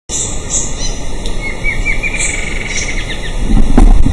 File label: Herpsilochmus rufimarginatus; PP Salto Encantado